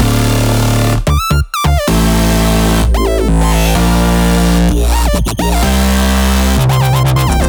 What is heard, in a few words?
128BPM Melody Root D; Uprising; Vengeance Samples; Uprising Kit